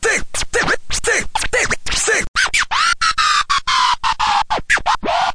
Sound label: 090 dirty vox scratching